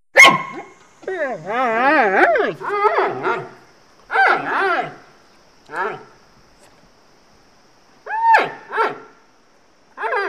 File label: Panda Barks, Growls. Two Pandas, Loud Barks And Growls. Close Perspective.